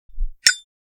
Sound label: Metal fire extinguisher trigger